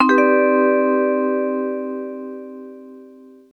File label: TV Suspense Vibez-C, 135; Zero-G Kocktail Kollection; Construction Kitz (Lounge); TV Suspense Mixes 135